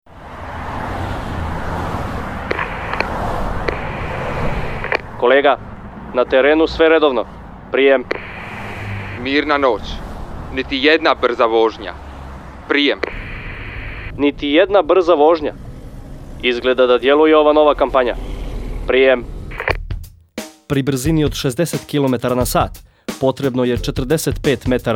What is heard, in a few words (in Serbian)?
radio dzingl